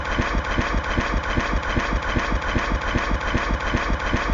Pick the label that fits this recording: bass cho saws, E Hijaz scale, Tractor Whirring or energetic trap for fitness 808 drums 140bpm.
Tractor Whirring